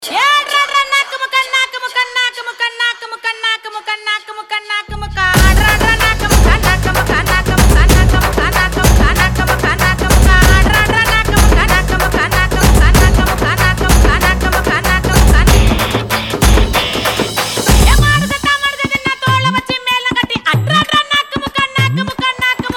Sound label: remix